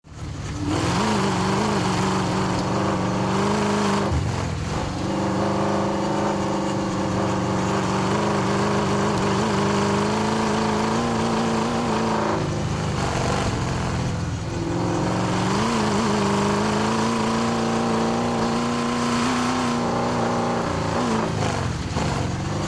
Chevy V8 Hot Rod Long Sound Engine Medium Driving Around; Recording from driving around onboard a Chevy Hot Rod; Lots of acceleration, slowing down, engine